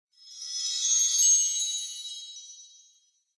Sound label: chime